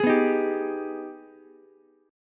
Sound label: G sharp Minor Major 7th G sharp down Ukulele; strummed; JGuitar